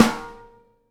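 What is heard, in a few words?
snare bottom DAMP, Live Snares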